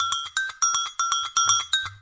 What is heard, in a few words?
121 bpm busy agogo